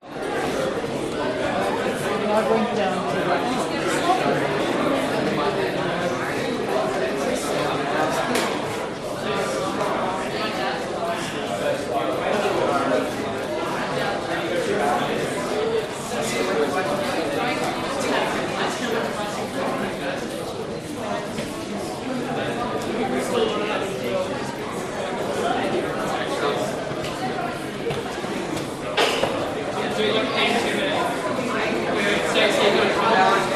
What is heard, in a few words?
public places art gallery of nsw gathering school children foyer walla sydney aus; australia